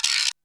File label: REV GUIRO; Back In Time Records; Big Bang